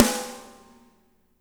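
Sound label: snare right; Spectrasonics; Burning Grooves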